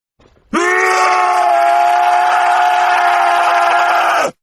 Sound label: rage; void reaver